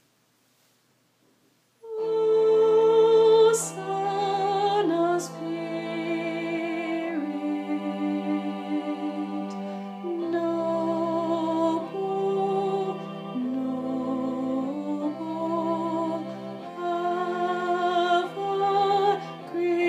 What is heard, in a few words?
soprano